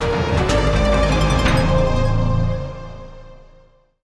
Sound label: Mus Invasion Success; Space Tyrant